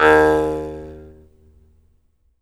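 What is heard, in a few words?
Jaw Harps, Pitched Percussion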